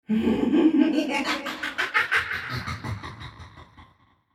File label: creepy laughter echo